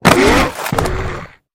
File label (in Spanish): caida